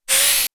Spinner

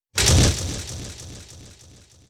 Prison door

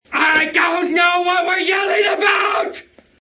yelling; Anchorman

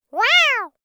Fairy wow

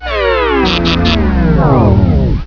fade

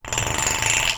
Bottle friction

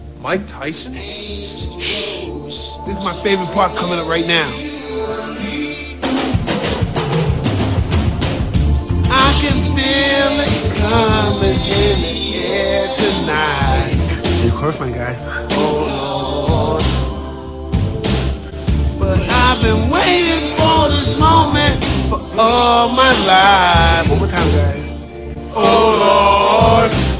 singing; The Hangover

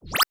Pulse gun

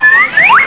slide whistle up

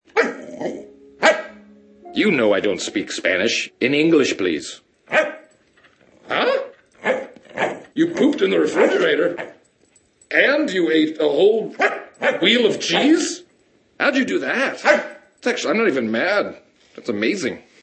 dog; Anchorman

